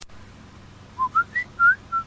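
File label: twitter whistle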